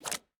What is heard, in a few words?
pick a card flip; LoL; twistedfate